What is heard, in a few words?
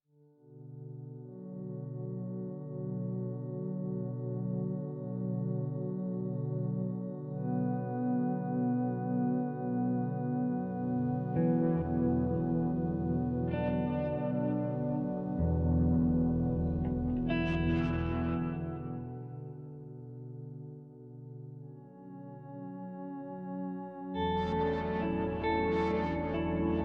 Tower Ambience; watch dogs extra and side content music gamerip